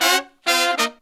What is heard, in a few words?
Phantom Horns, 132BPM; 90 s Sample CDs; Zero-G